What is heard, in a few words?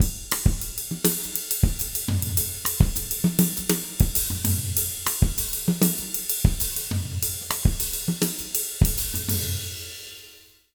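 100 Mozambique, jazz latin drumloops; USB Soundscan vol.08; AKAI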